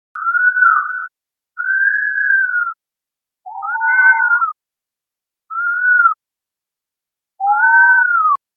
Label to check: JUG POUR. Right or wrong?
Wrong